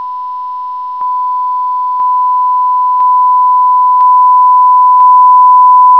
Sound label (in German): lauter